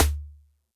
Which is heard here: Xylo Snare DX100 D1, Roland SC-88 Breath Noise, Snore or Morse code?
Xylo Snare DX100 D1